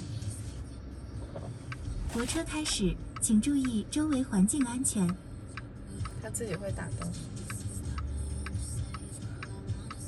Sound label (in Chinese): 自动泊车场景声音; 小鹏